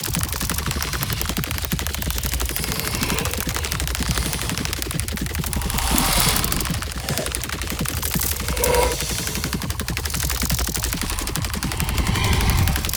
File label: Infested Oni Move Loop; Warframe